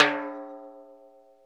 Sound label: timbal; Misc Percussion